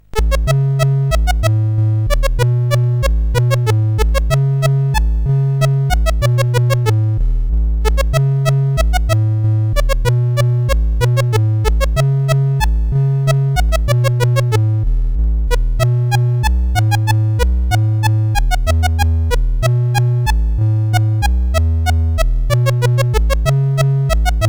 CSG8580R5, GAMES; WitchSwitch

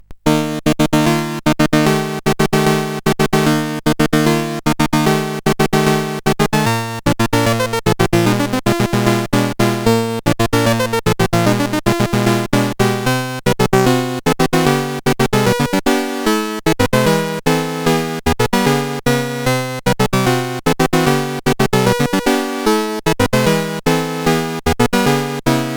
Fanfare, CSG8580

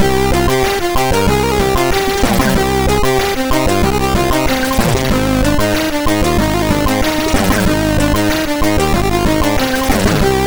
Driver Test, MOS6581; Driver 13 Test Hubbard

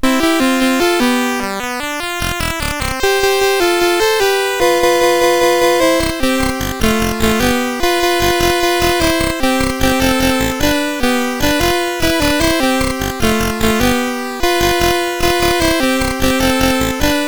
La Bamba, MOS6581R4

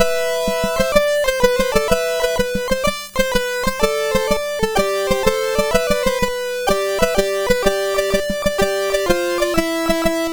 Medieval Song sid MOS6581R2

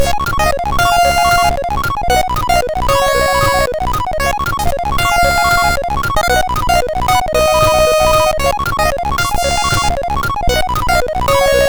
Skazastan, sid MOS6581R4

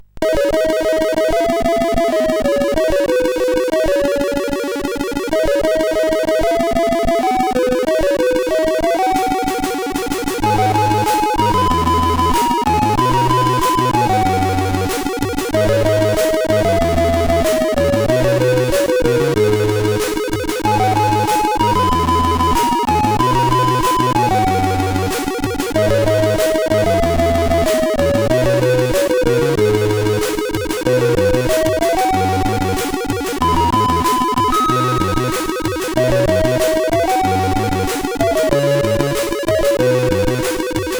sid, CSG8580R5; W8ing4godot demo

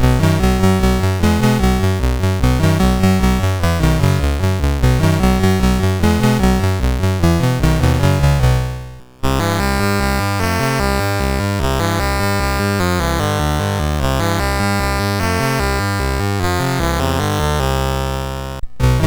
Kumbayah, MOS6581R3

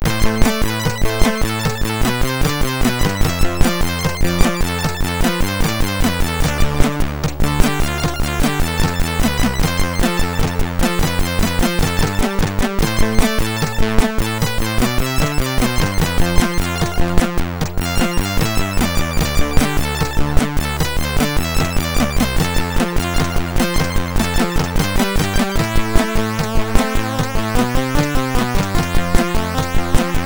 Clik Clak, MOS6581R3

